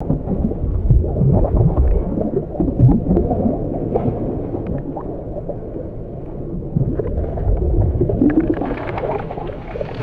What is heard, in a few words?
continuous underwater bubbling and sloshing ambience